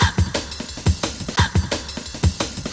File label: 175 D&B Drums